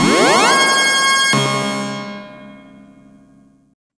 organ synth

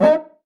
OPEN CUIC, TR-909 drums

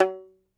Tenor Banjo, Plucked Strings

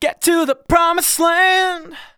vocals sung F#; Techno Trance Essentials

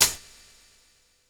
HAT, Hip Hop; PlatinumPhattCD1; E-MU Producer Series Vol. 8 – Platinum Phatt

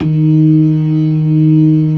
ORGAN LOWER MANUAL

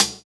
Closed Hat, JUNGLE BREAKS

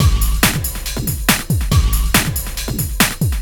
808 Roar at 140 breakdown; Sonic Foundry (Sony Creative Software); Ugly Remnants VOL-2

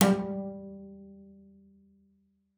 BBIZ G3; Xsample VOL-5

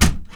Cat Flap, household rhythm samples Hits

